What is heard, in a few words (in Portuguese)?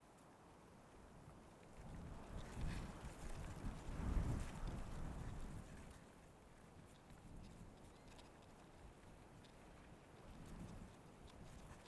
Vento suave grama se mexe bastante na estrada de sao jorge